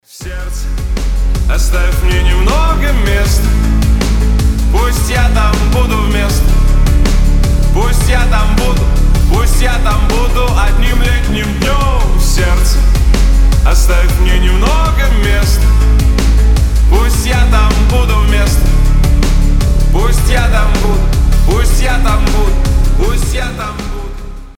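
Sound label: nightingale slowed reverb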